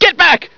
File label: sci fear, scientist